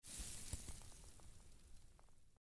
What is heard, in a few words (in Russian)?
legkaja osyp snega s dereva